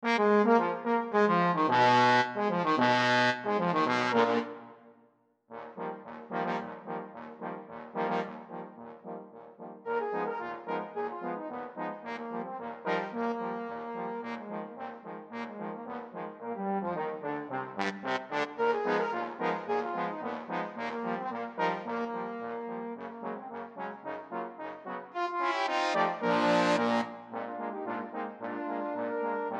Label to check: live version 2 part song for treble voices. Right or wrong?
Wrong